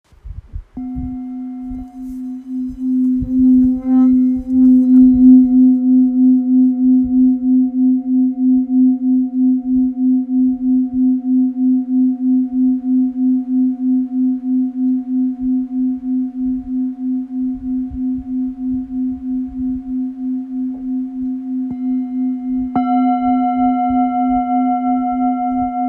Bol bengale fa diese

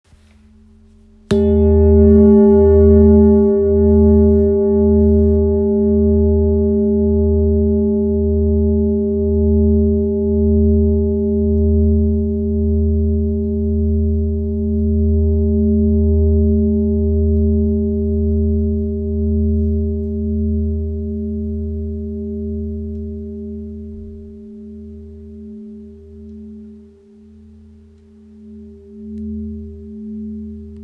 Bol ramgandi sol diese

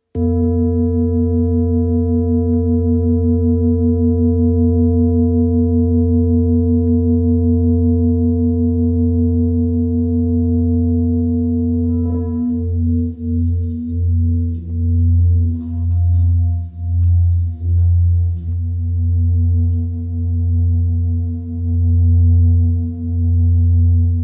Bol ramgandi la diese; Bol chantant Ramgandi à Paroi Fine

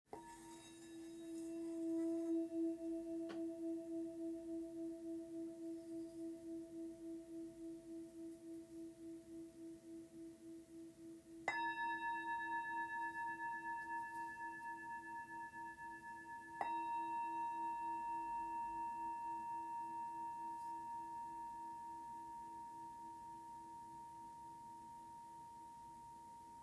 Bol grave mi bouddha; Bols Chantants

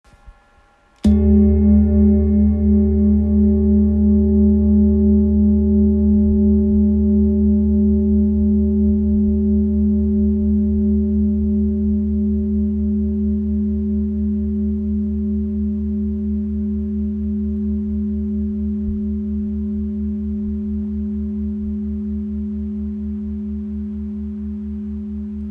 Bol ramgandi re

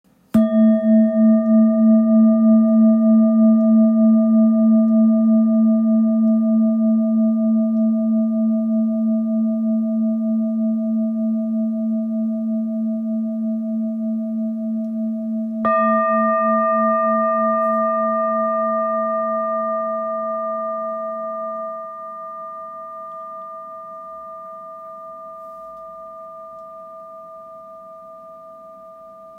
Bol fullmoon la

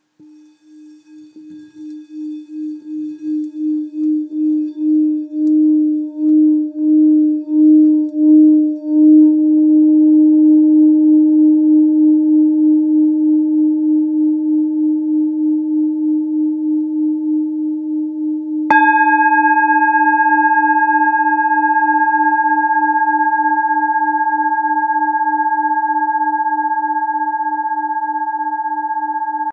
Bol Thick re diese

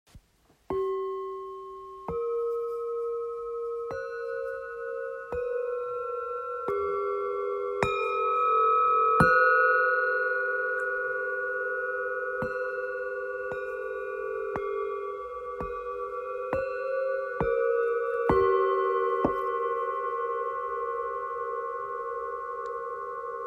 Set 7 bols mystere

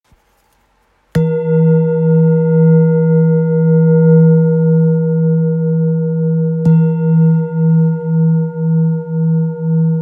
Bol bengale re diese 1.2-1.3kg

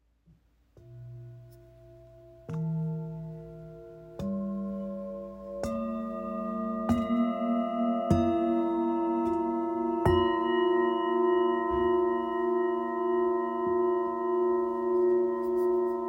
bengale; intuition 7

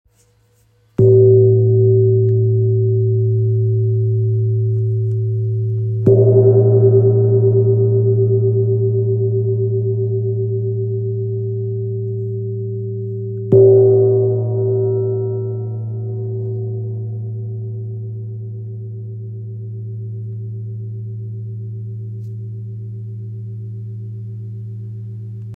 Chau gong 50cm la

